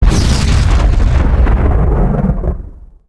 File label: explode